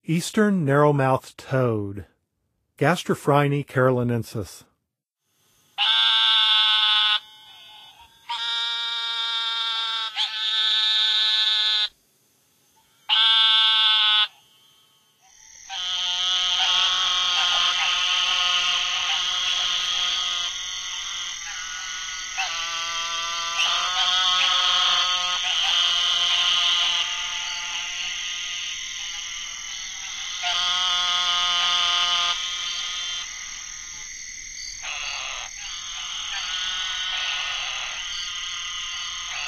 Eastern Narrowmouth Toad; N. America; Songs of Frogs & Toads of North America

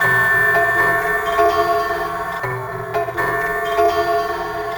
Wound Harp; Electronica; Tonal

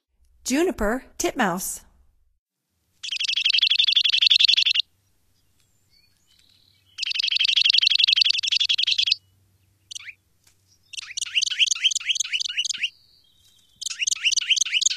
Juniper Titmouse; North America; Stokes

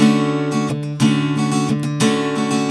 Guitar Dmaj7, Acoustic

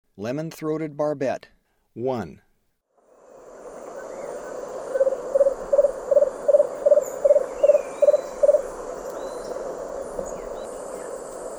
Lemon-throated Barbet; S. America; Eastern Lowlands

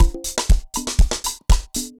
dancefloor perc 120 bpm

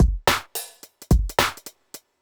clapper 108

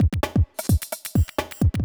electro kit 130

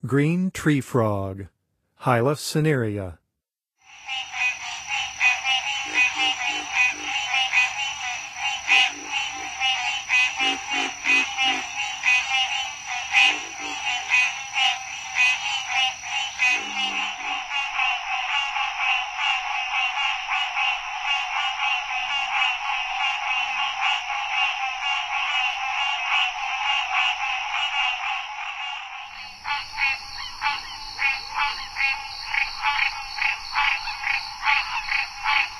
Green Treefrog; N. America; Songs of Frogs & Toads of North America

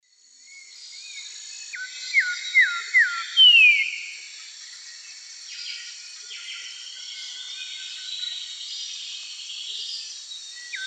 Saltator coerulescens, song; S. America; Eastern Lowlands

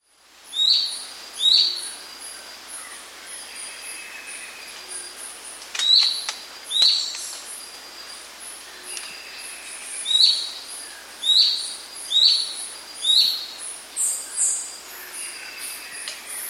Slaty Antwren; S. America

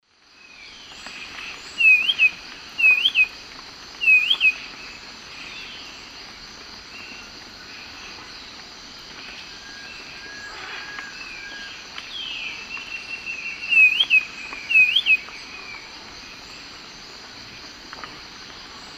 Pachyramphus marginatus, song; S. America; Eastern Lowlands

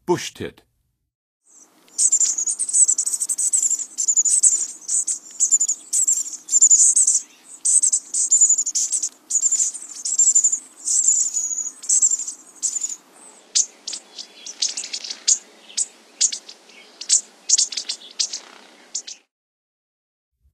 Bushtit, Western USA Songbirds; North America

